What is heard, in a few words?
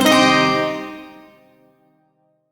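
Shawzin Pentatonic Minor Chord; Music; Warframe; Duviri; Chords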